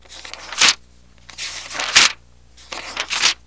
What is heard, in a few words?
timer